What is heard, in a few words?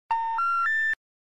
outgoing invalid number, phone